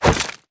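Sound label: melee attack gun medium; viper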